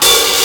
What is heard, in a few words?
HH OP; Big Beat Samples & More; AKAI